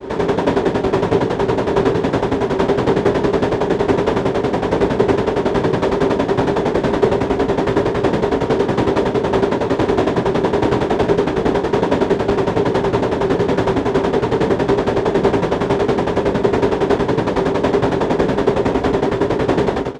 Synthetic Steam Engine

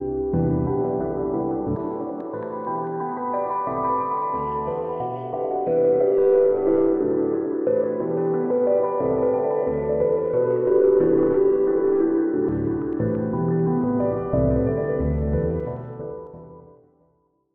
Ambient Piano Loop; Ambiance

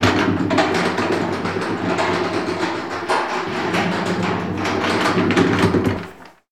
Rolling Warehouse Long Tiles Puzzle

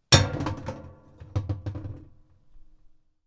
Bottle Dropped In Trashcan

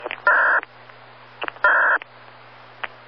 Radio Click Noise

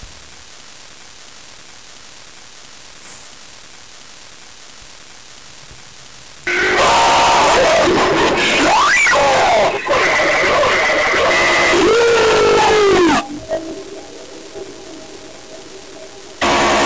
Glitch

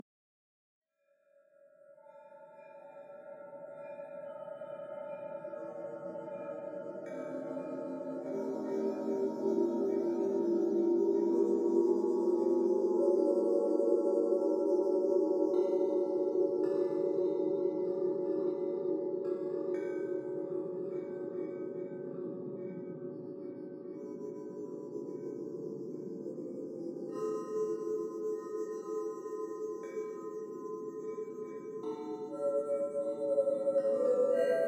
Spooky Bells Ambiance

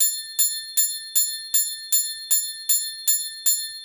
Bell Loop

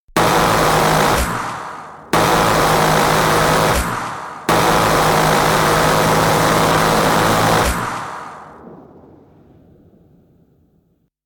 M134 Minigun Firing

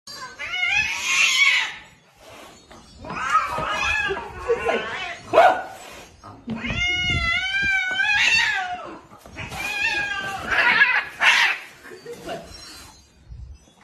Angry Cat Sounds Without Any Cat Being Hurt

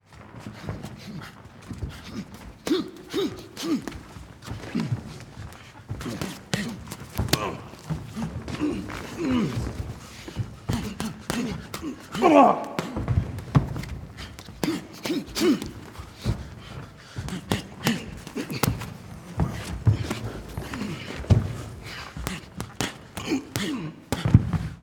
Box Fight Boxring Competition Boxing glove

